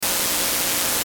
Static Analog Buzz; Production Element Imaging Element Accent Transition; Blastwave; Static Sound Effects

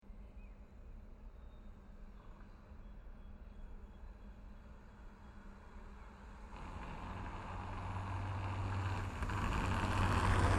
Paris City Car Passby Cobble Stone Road; Europe France; Traffic And Car Sound Effects

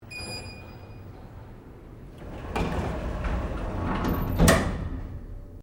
LIFT APARTMENTS NEW DOOR CLOSING INTERIOR

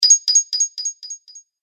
Collect Coin With Delay Game Fx; Video Game Sound Effects

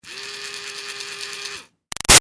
Toy Fairy Wand CU Run Short; Miscellaneous Toy Sound Effects; BigRoomSound

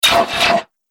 Science Fiction Laser Doorway

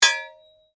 Blade Heavy Moving Impact, Sword

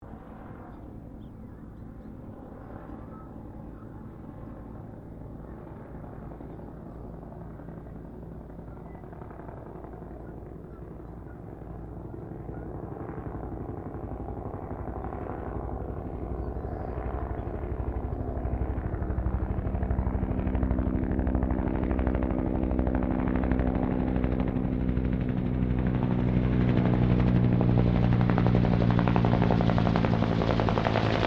HELICOPTER BELL UH1 IROQUIOS PASS LONG

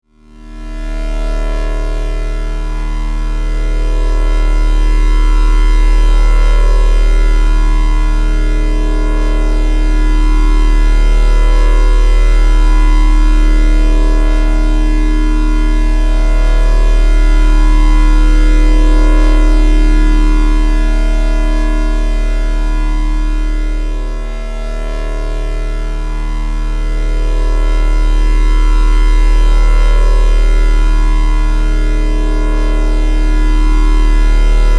Electrical, Hum, Scifi; 5.1

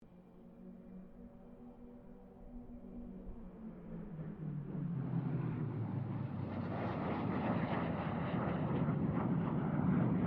AIRCRAFT GLOSTER METEOR F MK8 PASS DISTANT; Gloster Meteor figter jet; Airplanes

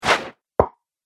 Dagger Throw Hit; combat; Knife

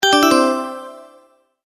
multimedia tone alert

